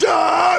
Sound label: pain; vegeta